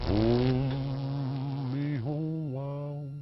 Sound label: ooohhh; gijoe